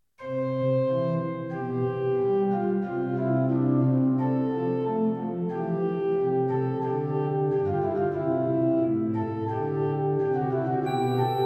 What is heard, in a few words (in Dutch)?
trio voor orgel; j g vierling